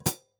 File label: hat pedal, closed hat